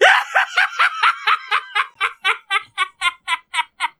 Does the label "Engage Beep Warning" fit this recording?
No